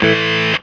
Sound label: GTR; USB Soundscan vol.32; Roots Disco; AKAI